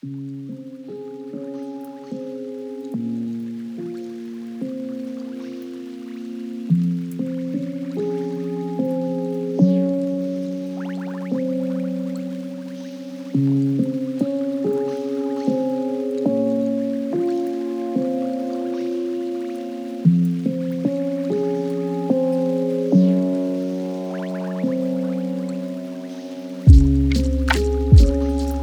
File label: lofi hip hop